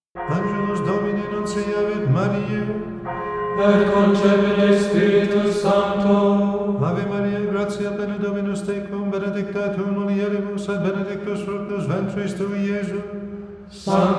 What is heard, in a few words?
Angelus antiphon